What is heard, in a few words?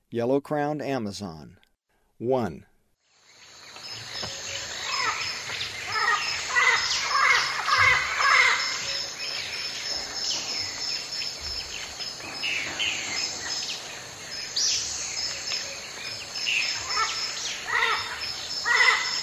Yellow-crowned Amazon; S. America; Eastern Lowlands